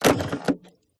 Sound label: Espresso Machine Coffee Portafilter unscrew